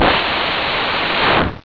Increasing "balloon" inflation/expansion (metaphoric sound); Expanded tree